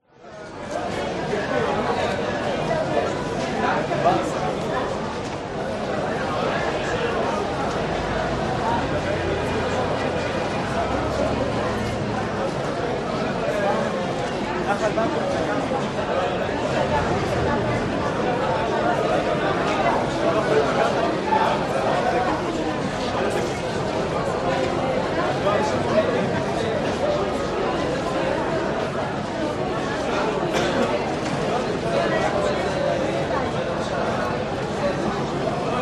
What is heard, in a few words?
Bus Station; audio hero